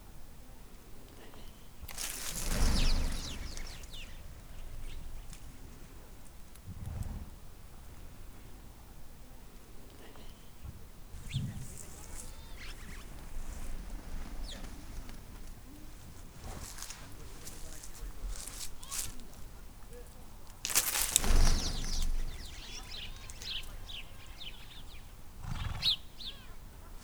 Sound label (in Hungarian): felröppenő csapatok, házi veréb